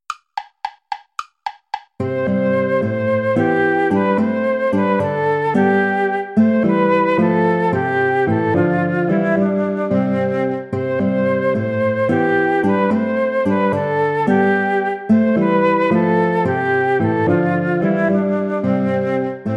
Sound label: play along without guitar; hassler hans johann leo; ein feste burg ist unser gott